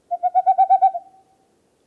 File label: Owl